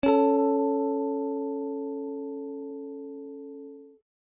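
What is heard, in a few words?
string A tone C sharp 4, elec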